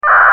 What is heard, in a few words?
MDC600; Motorola MDC-600; This is an alternate form of the same data stream used in MDC-1200, only at 600-baud and not 1200.; This was recorded from a Motorola Saber